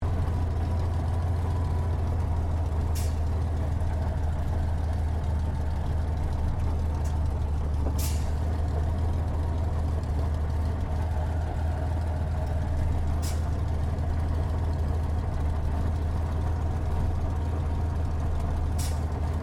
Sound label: AIRCRAFT SUPERMARINE SPITFIRE MK-XVI IDLE INTERIOR STEREO; Airplane Sound Effects